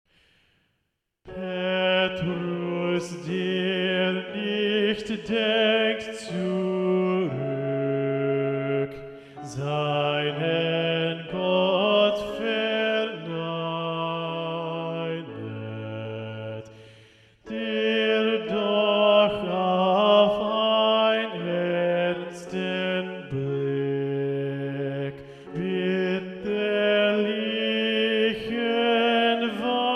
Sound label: Bass